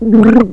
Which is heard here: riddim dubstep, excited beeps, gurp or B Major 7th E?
gurp